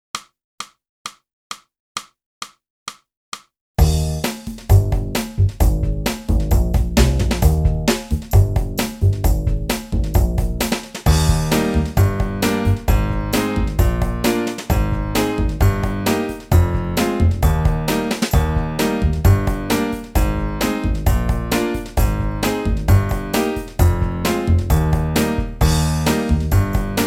sax

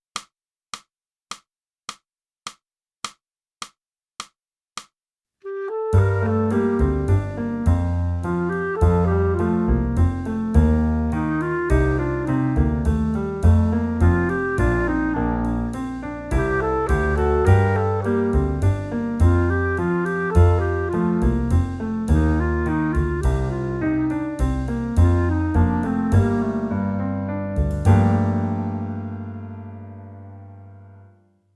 clarinet

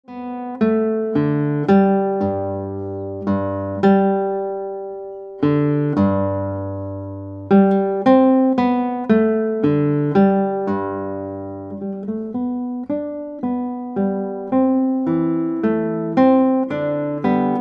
Guitar

